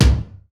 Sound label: silky kick drum sound b key; tuned drums